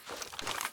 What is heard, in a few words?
mp133 reload end, weapons